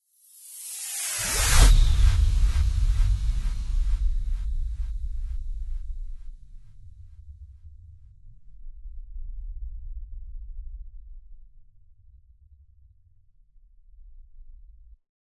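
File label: time machine or teleport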